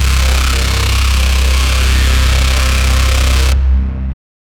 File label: very deep heavy base, braaam